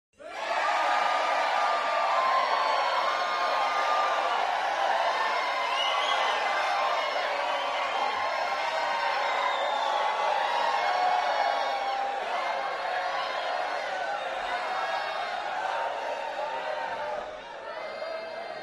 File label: Crowd 100 W Whistles; medium int